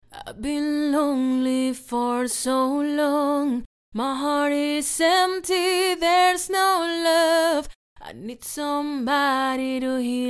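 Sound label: pop 118 D major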